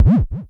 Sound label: TOM, Klone Dual-Percussion-Synthesiser; KLONE FX NW8